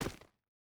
footstep hide stones run; Rust

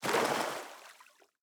footstep water land; Rust

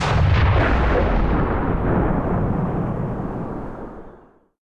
20 23mm tail far, guns tanks; War Thunder

